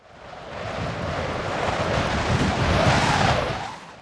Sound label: destructive hurricane